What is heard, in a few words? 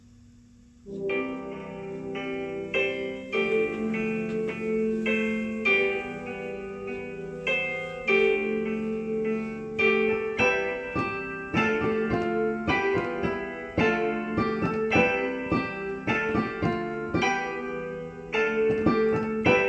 Fashionista Girl with Melody, piano